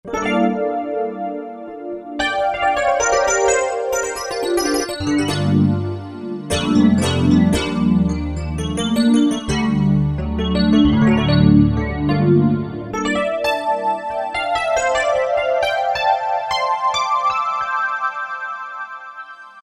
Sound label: Elec Pno, E-mu Morpheus; Factory Presets